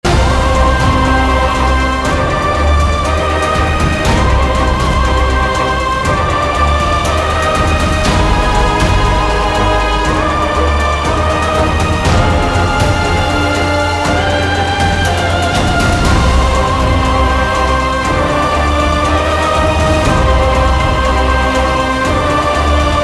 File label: Loop Action; Volume macos ps vita ps4 windows gamerip 2015